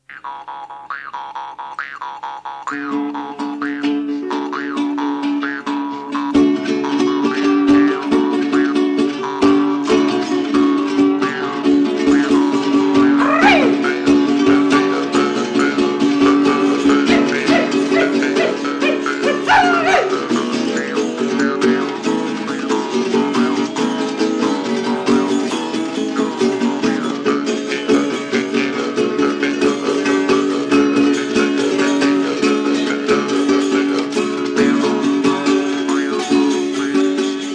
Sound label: Jam